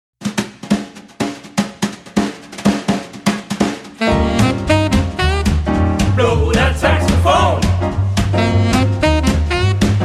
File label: Blow That Saxophone